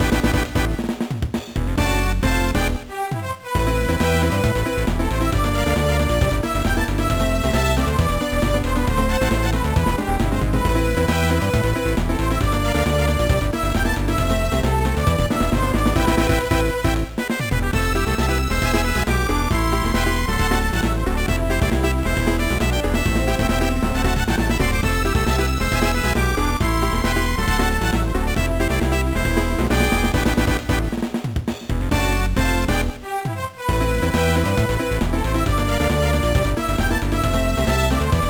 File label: BGM; Slot Pro Advance Takarabune Ooedo Sakura Fubuki 2; GBA